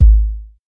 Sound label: wet kick drum single shot g sharp key, Royality free kick drum one shot tuned to the G# note